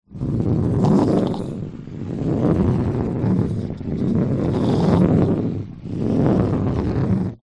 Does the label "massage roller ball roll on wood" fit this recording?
Yes